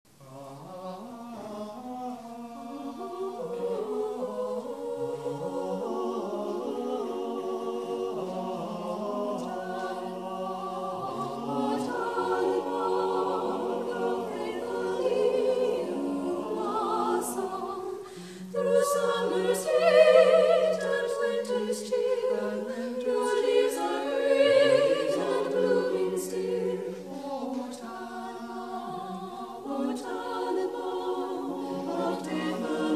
Carolers

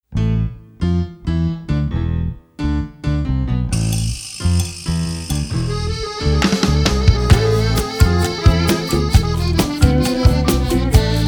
Country Singer

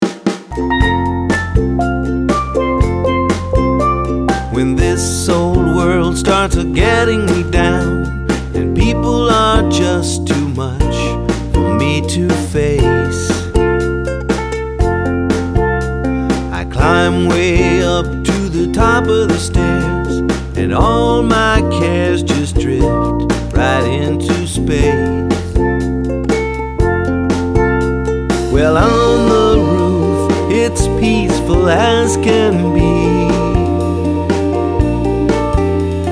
Caribbean Band

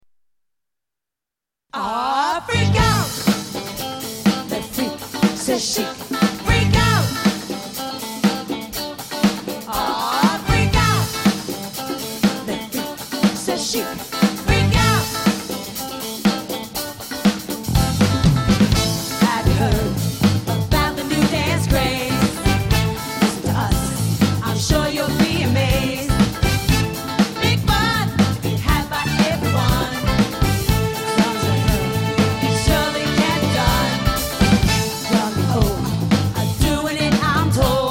Disco Band

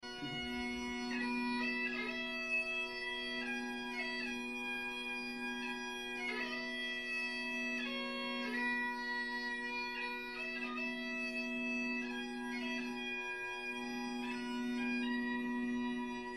Bagpiper, Amazing Grace; Savannah

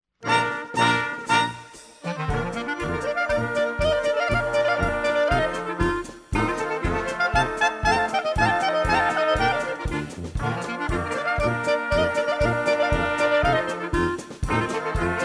German Band, Clarinet Polka; Chicago German Band

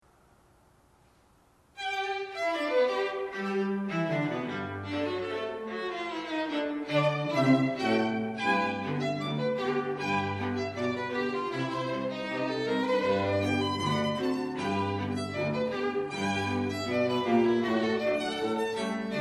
String Quartet, Rag; Baltimore; This Baltimore String Quartet is a professional string ensemble

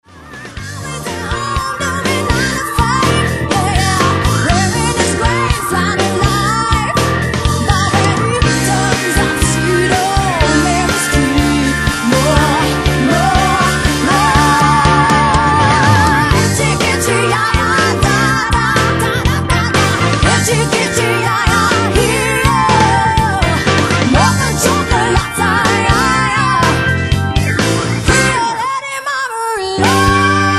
Variety Band; Tampa

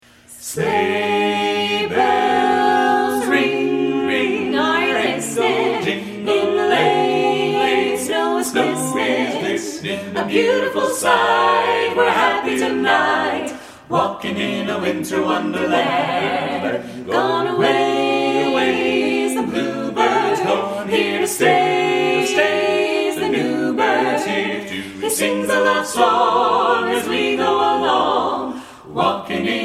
Carolers

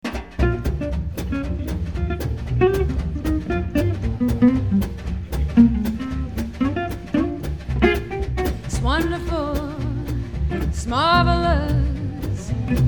Jazz Band; Atlanta; "mini-big-band" playing blues, boogie, dixieland, and jazz

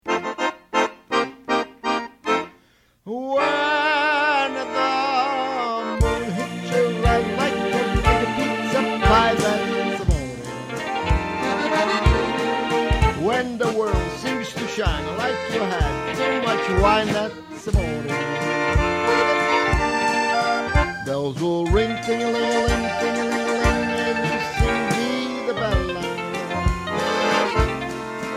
Italian Accordionist

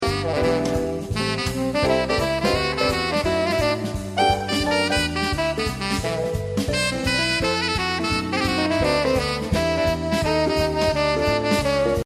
Jazz Saxophonist; Albuquerque

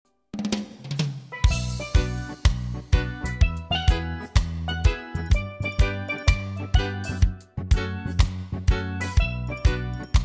Caribbean Band; Chicago

